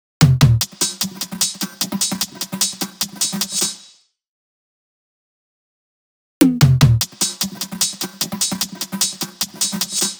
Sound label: SD SEISMIC perc Wudalianchi 150, Perc Loops; Slate